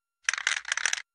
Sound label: Klik Klak